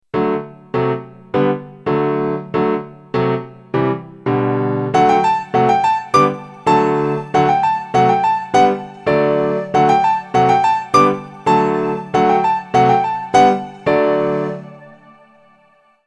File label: rhythmic piano ringtone